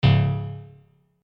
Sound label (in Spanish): Fallar Disparo; SFX Gameplay 3